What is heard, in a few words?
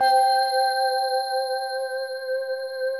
SUPER CLEAN C5; Greytsounds - Scott Peers Sound Engineering